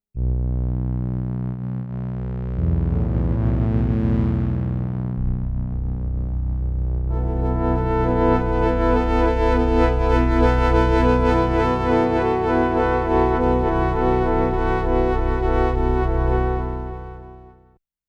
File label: stereo pad